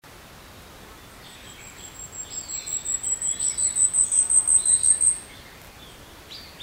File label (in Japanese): yabusame